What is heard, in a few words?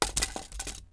blaster hit concrete; objects; Star Wars Obi-Wan; XBOX